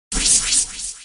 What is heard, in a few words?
skill attack